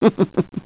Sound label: LAUGH; BattleSNDS